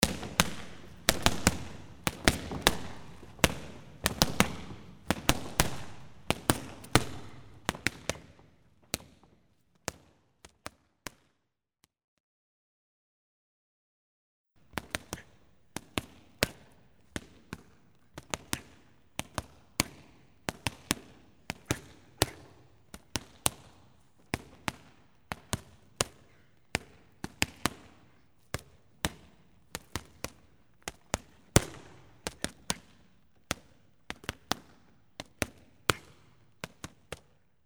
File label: boxer training ring